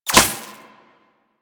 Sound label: Laser Impact Metal; Warframe; Weapons